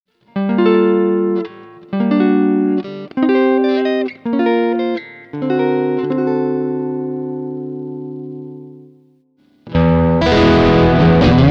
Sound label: Tech 21 SansAmp GT2